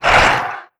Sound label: grunt soft, antlion; creatures; vox